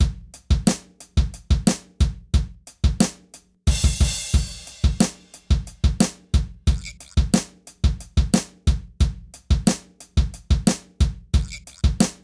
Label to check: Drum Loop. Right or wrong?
Right